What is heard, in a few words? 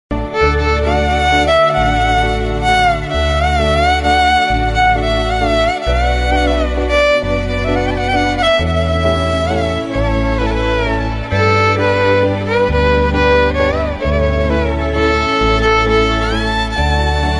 INSTRUMENTAL RINGTONE